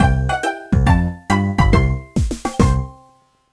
Mg Normal

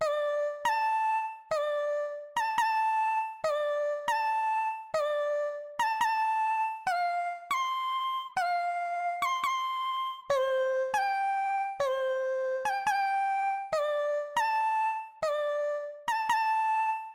Mountain Music Layer; Weefox

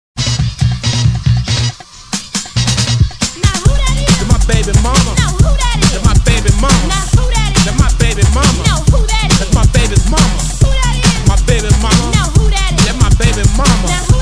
Solo Bass Mix; DDR

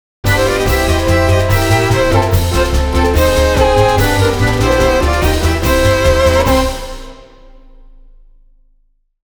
Stage Clear; New Super Marisa Land

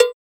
Tock; Minigolf

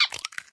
Quail Egg; Farm Mania 2

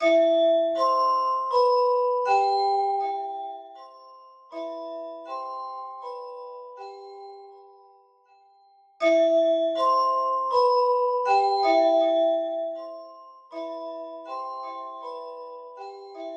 Music Box SIMPLE LOOP NO REVERB; unrest indigo

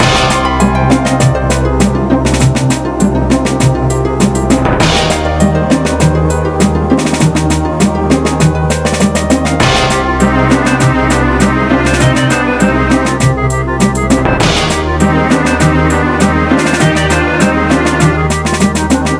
Pirate; Jack Axe

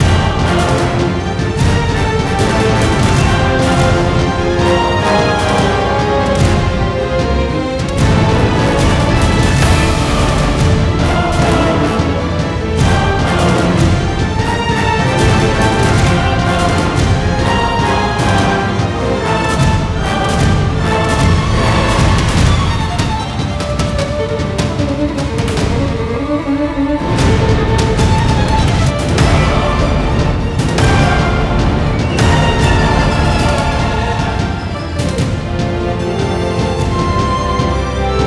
Loop; Lost Epic

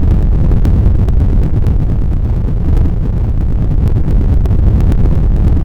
Rumbly

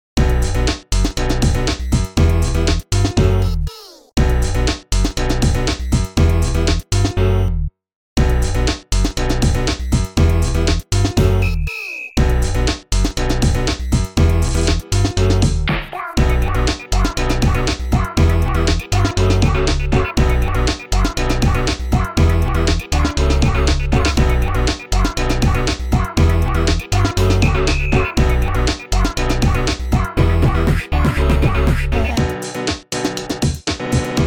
Instrumental; Friday Night Funkin Funkin Peanuts

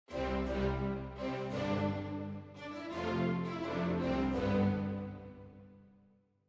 Stinger Event Enthusiastic

